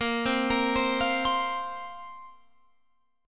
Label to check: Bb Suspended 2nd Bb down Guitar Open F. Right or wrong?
Right